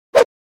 Swish Single; Crashes Impacts

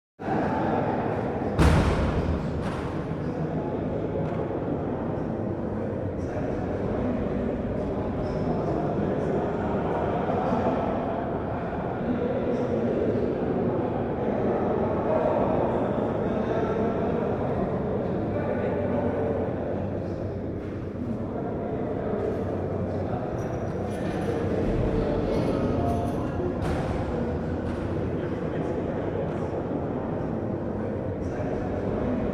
Auditorium Large Male Crowd Murmur; Interior Crowds

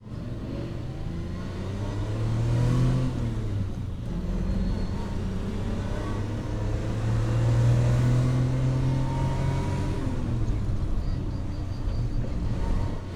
driving; 1000fx

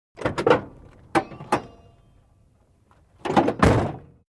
Car Door Open Close 64 Cadillac; Cars

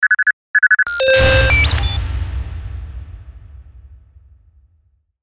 Location Change Transition; Best Of N2O Library; Breakers & Transitioners

